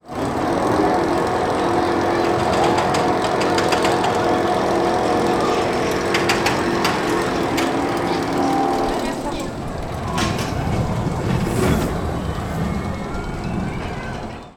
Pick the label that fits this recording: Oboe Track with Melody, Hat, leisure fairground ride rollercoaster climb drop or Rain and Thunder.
leisure fairground ride rollercoaster climb drop